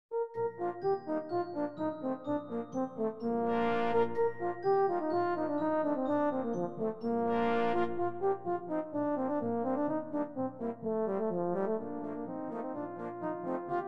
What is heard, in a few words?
Brass Band; Le Basque